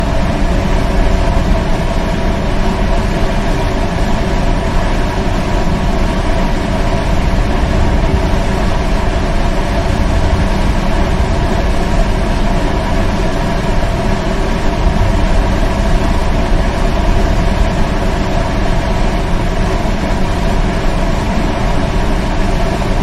dry

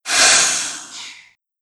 charge, vehicles

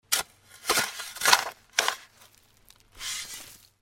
shovel

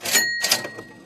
money

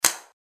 batt in, Vehicles

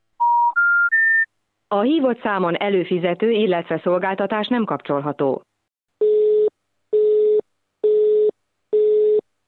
not Found, call

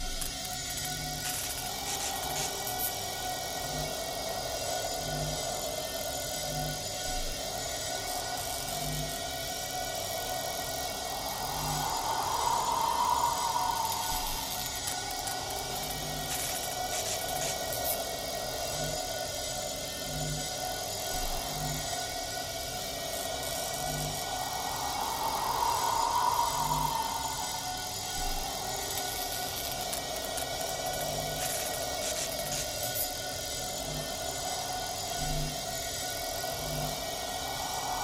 portal

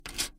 move, Crate

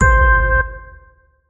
start

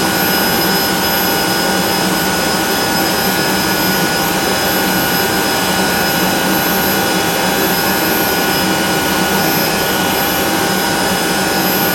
turbo, vehicles

